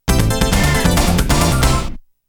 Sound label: Game Clear; Namco Game Sound Express Vol.19; Mach Breakers